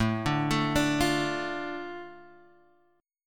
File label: A Suspended 4th Sharp 5th, down, Guitar, Open D